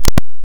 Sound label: jump; ゆめ2っき